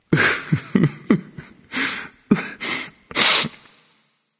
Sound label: boo hoo; smite; voicepack